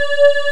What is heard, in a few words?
Flange; Total Irrelevance 3